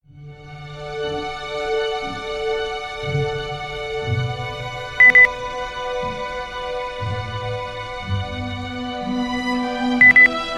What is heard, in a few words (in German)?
klassik